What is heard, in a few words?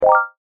Compound w Low Pass Filter; phet io wrapper sonification; molarity